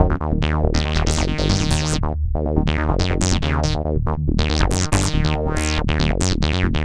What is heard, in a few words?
Bass Loop